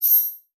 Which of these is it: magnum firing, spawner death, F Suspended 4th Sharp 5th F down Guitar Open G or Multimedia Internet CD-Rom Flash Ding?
Multimedia Internet CD-Rom Flash Ding